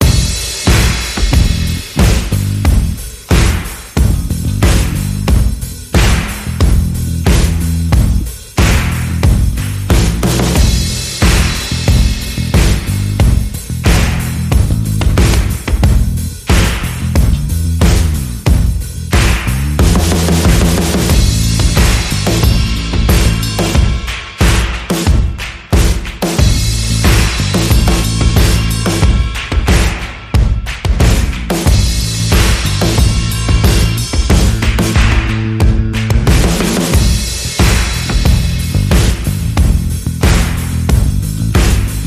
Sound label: Percussion Bass and Effects